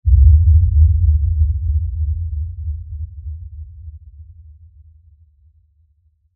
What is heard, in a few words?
LFE Impact; Production Element, Imaging Element, Accent, Transition; Low Impact